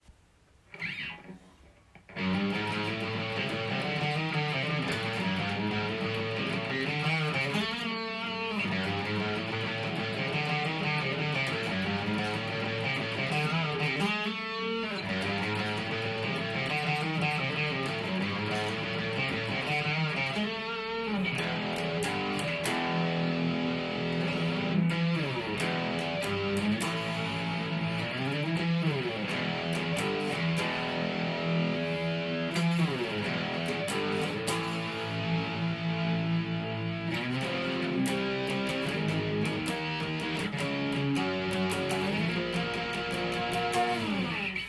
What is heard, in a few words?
Gitarrero D